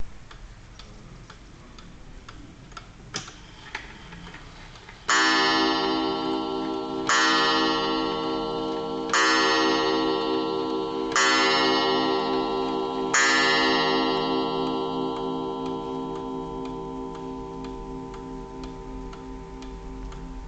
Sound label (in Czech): hodiny